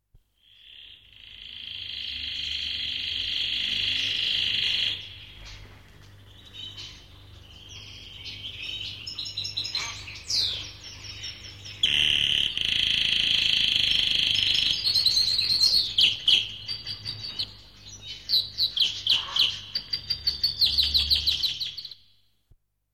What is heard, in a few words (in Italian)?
uccelli birds, animali